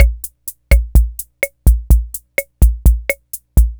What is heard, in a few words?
126 BOSSA, Electro Crash; maudio